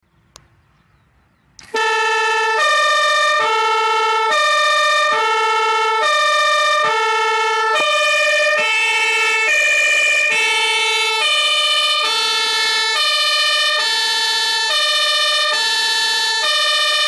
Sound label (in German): martin horn